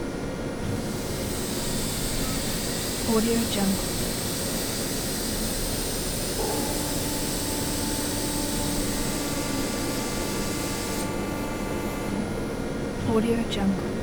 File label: bonus source ambience train factory; Robotic Lifeforms 2; machine room